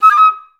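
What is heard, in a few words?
flutes D#5; Best Service; Peter Siedlaczek's Advanced Orchestra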